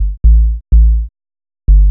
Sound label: HOUSE BASS 125 A